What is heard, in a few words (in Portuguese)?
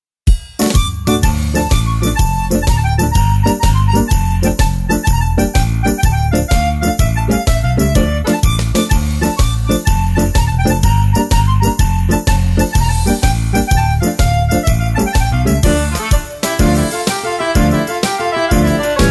TARANTELA